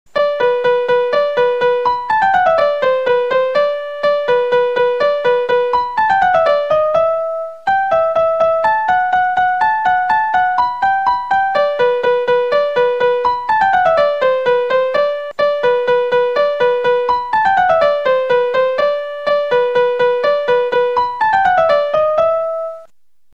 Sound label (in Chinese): 音乐铃声